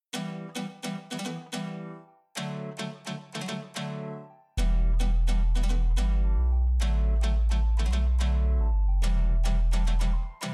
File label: Instrumental; Happy Hollow Hello Promo Track